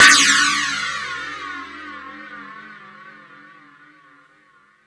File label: Crash 2, drum machine; E-MU Pro-Cussion-1